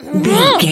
voice female attack

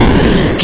retro explosion short

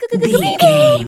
voice fun character vocal crazy

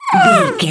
goblin fairy hurt pain

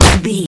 kick heavy impact

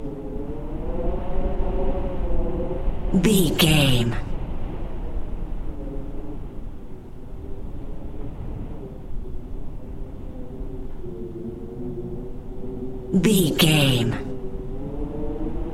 wind cold howling haunted night loop

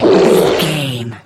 troll monster battle grunt

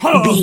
voice male hurt pain

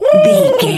pigeon call angry

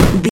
retro impact hit general

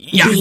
voice male attack

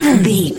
voice male attack

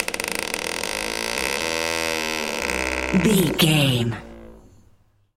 door creak long

